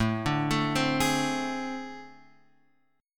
A Minor Major 7th Double Flat 5th, down, Guitar, Open F; arpeggiated; JGuitar